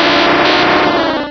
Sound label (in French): Cri